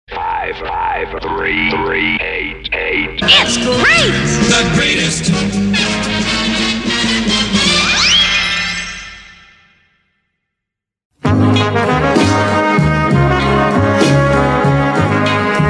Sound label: Jingle Compilation; Veronica